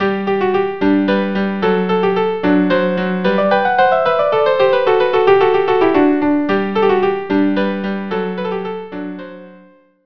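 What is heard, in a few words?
violin viola duo; KV 487; polonaise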